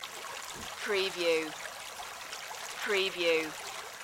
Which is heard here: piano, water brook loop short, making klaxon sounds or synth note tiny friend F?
water brook loop short